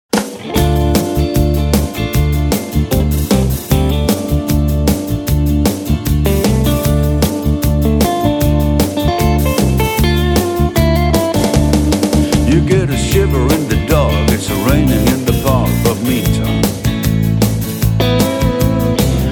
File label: collage rock